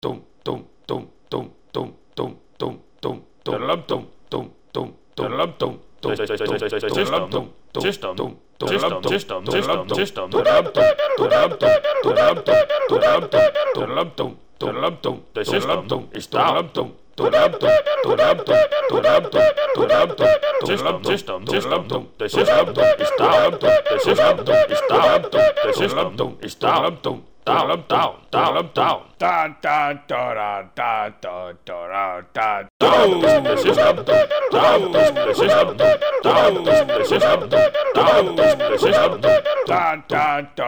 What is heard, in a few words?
sb techno